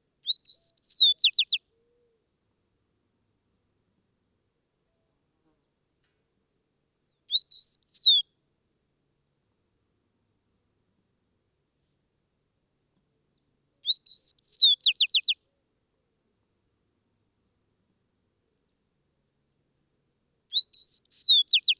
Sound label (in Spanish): chincol, aves; lampa